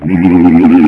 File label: pain; quake2; sorb